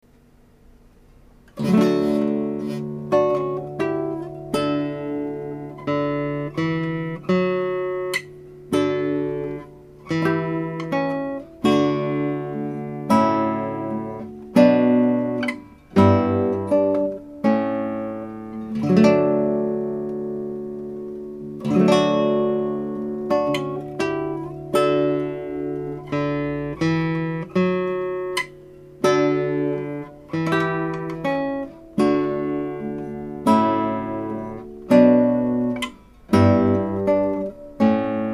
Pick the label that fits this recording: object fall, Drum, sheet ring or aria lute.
aria lute